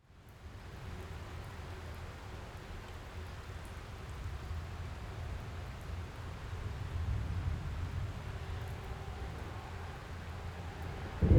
Ambience Storm Medium; AdobeCC

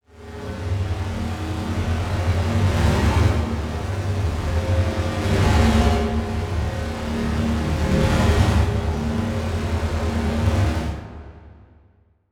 Title Transition Stab Dark Long

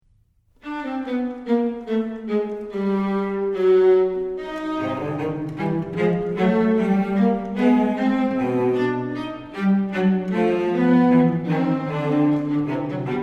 Canon a 2; Bach; Musical Offering, BWV 1079; Quaerendo invenietis

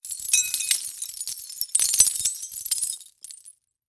Impact Glass Debris Fall To Cement; Impacts

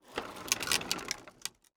Household Bike Roll Plastic

